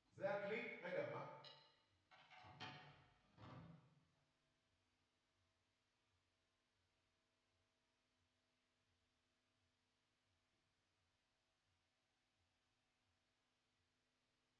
Drums Verb

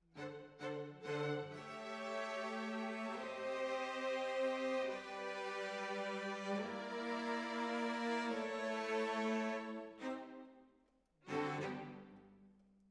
Decca, Jazz for piano

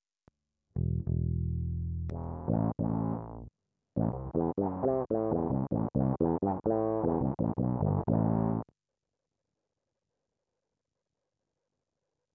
Bass DI

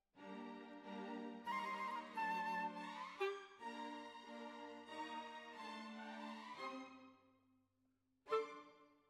Flute

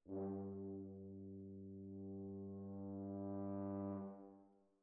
ORTF, Jazz for piano

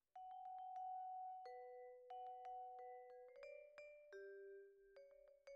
Vibra Close

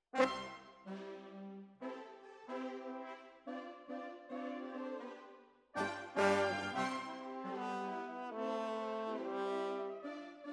Horns

